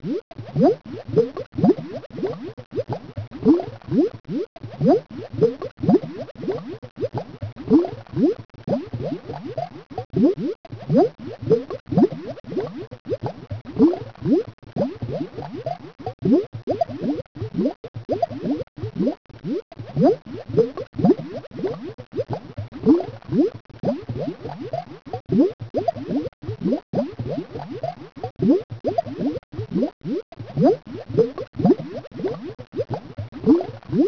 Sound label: bubbles